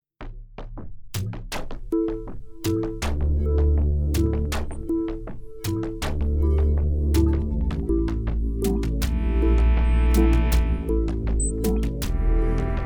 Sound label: cue beat; Great Minds of Design; 291 Film Company 2012